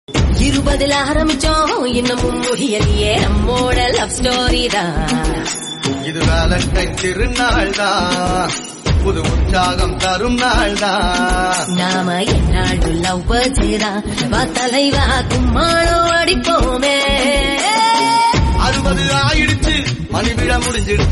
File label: Tamil Song Ringtone